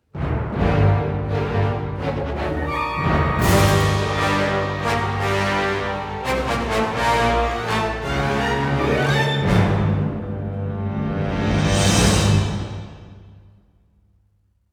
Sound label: Battles Imperial Lose; Hoth